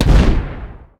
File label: imp mortar